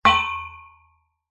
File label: impact metal pole hit with blunt object